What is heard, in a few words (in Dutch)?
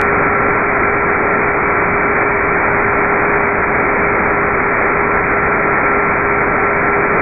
ruis; artemis dwingeloo; Eerste resultaten Artemis I maanmissie vanuit Dwingeloo